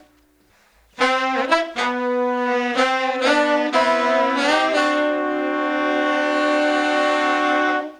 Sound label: Horns, 70s Analog Funk